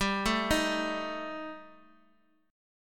G Suspended 2nd Sharp 5th G, 4G, 5C, 5E, 5A; chordshape; down

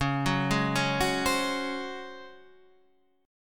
C sharp Major 7th Suspended 4th, Guitar, Standard; arpeggiated; chord; 9,9,6,6,7,8; JGuitar

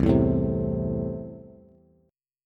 C 6th C down Bass; C6 strummed

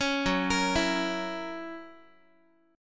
Gb 7th Gb down Guitar, chord; 16,14,13,14,x,x

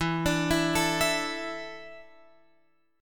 E 7th Suspended 4th, Guitar, Open F; E7sus4; arpeggiated

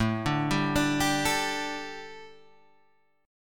G Suspended 2nd A, Guitar

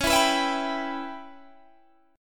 C sharp Minor 7th Flat 5th, Guitar, Standard; C#m7b5 strummed; 21,19,21,21,20,19; JGuitar

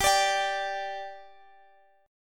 G 5th G, chord; G5 strummed

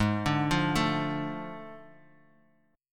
A Major 7th Suspended 4th Sharp 5th G sharp

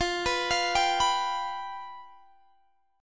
F Suspended 2nd Flat 5th, Guitar, Standard; arpeggiated; x,20,21,22,20,19; JGuitar

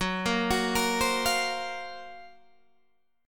F sharp Major Sharp 11th, Guitar DADGAD; chordshape; down

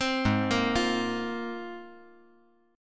Ab 6th Add 9th Ab; arpeggiated; JGuitar

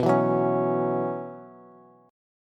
B Suspended 2nd Suspended 4th, Bass; strummed; JGuitar

